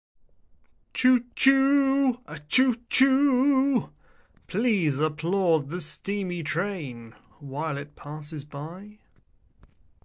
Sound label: Achoo Applause